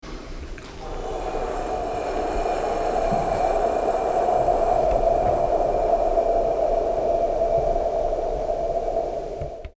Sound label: Six Healing Sounds Qigong 呼 HU